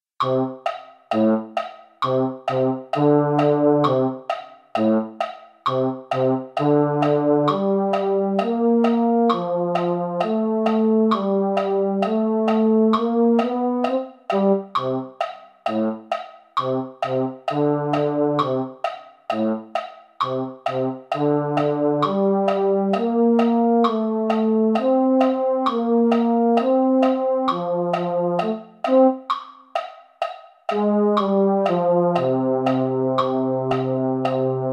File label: baritone; christmas classics medley part 1; holiday brass